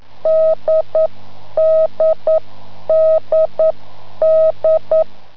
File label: 80m cw